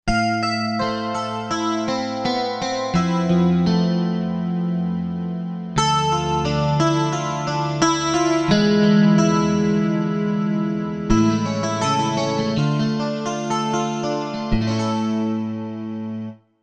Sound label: Strat Pad, synth